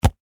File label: Sports Football Catch